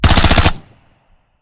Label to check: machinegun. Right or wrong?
Right